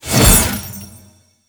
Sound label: Boss Sword Attack Whoosh, Weapons Melee; Warframe; Orokin Weave Sword